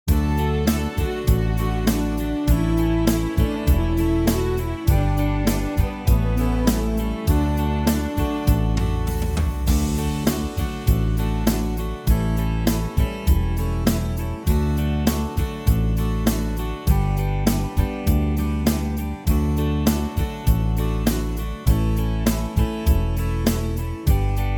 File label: backing; bassoon; auld lang syne; folk